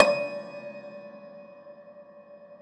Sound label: Rubbery Piano, prepared piano; Total Piano